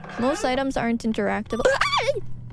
jumpscare; IRyScream

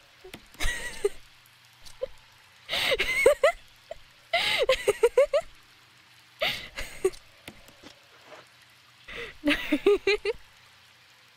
laugh

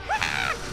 Wataaa; IRyScream

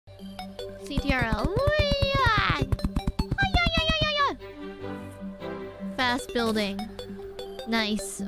fast building noises

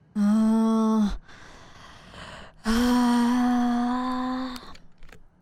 deep breath

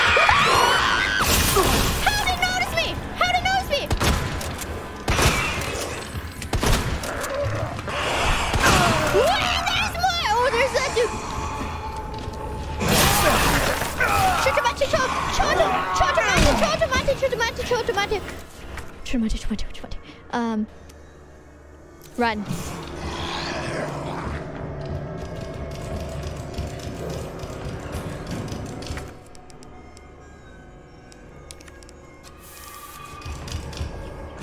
loudest scream; IRyScream

